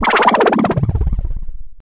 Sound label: laser; The Rift